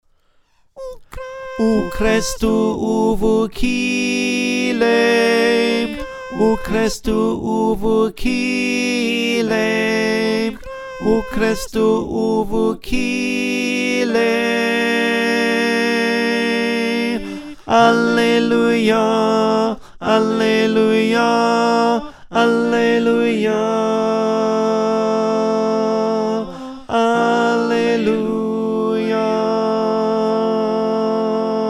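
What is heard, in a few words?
Tenor, choir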